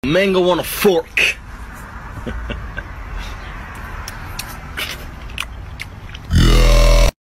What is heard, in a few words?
mango on a fork sound effect